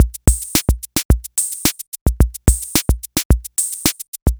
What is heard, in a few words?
Rock B